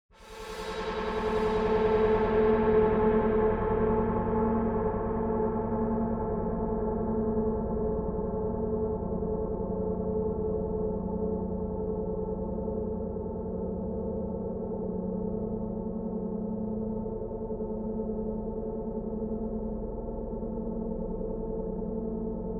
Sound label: bgm